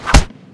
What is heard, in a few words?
knife hit, Fists; cstrike; JAIL